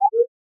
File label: owl notification; sonniss gdc